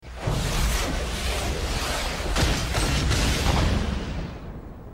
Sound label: opening sound; THQ